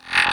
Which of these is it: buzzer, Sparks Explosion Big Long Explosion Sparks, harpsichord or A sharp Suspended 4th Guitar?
buzzer